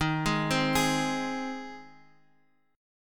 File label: G sharp Major D sharp